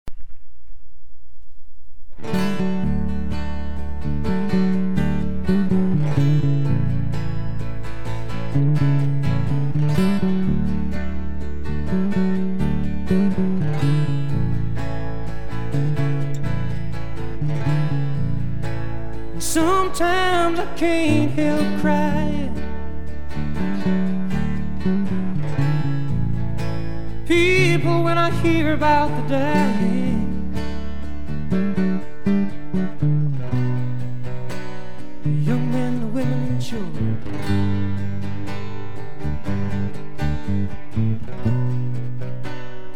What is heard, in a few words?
peace song, vinyl rip